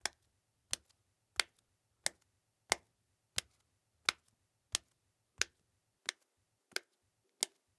Footsteps Cement; HauntedBloodlines; SFX Producer

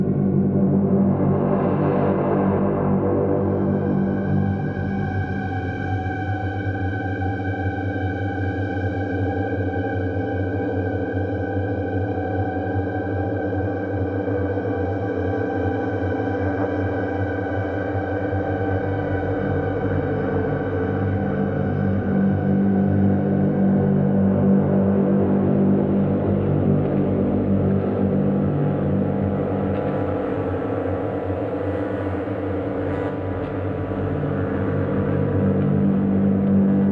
Heavy, Horror Atmospheres Music

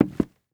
Footsteps Wood; HauntedBloodlines; SFX Producer

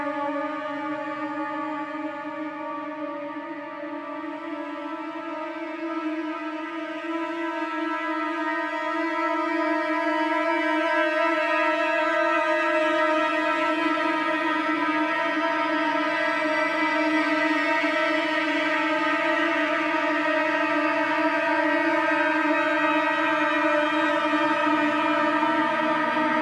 Unbearable Tension, Horror Atmospheres Music; Miasma - Horror Atmospheres Music Pack

